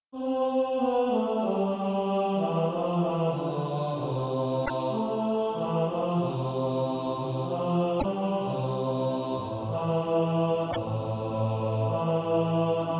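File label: bass; How great our joy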